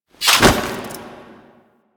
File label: Foley Sword Tap; Cinematics; Stalker; Tenno; Warframe; Orbiter Cradle